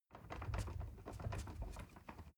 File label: ladder wood rattling long